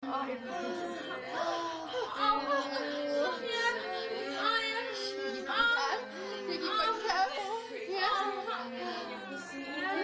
many sex, moans; The Witcher Hunt

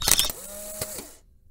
shot sound; Nude Camera

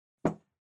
bottle put; The Witcher Hunt

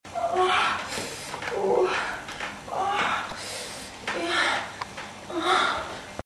sex, moans; The Witcher Hunt

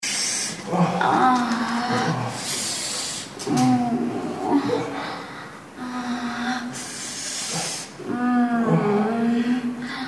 Indoor Foot; Sexy Witch 3 Hot Meal

